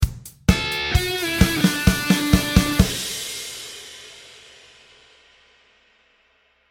smaller riff

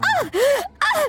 uauauuauau

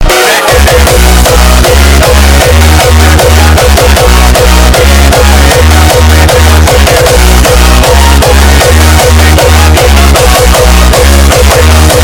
hardstyle

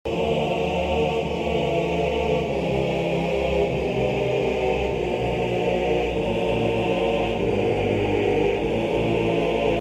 horror; sprunki pyramixed

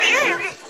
aaaawwwwaaaah; yoshi npc

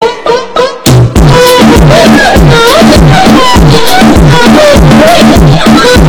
bass

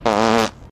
cartoon poot

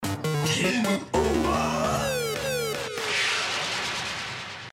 game over sound; ex aid

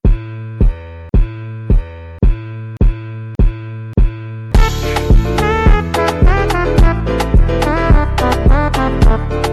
rat dance remix

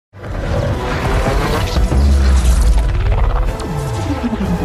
godzilla supercharged impulse

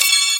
alert; ultrakill

